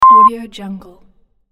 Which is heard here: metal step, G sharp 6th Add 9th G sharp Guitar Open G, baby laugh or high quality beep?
high quality beep